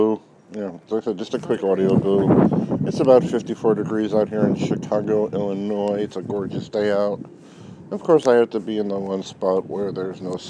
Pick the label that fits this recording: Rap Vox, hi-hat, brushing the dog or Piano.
brushing the dog